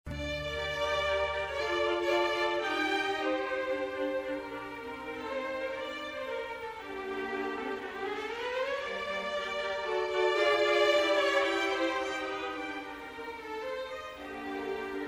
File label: Theme minor; Mozart Sym40 4th Mov Theme2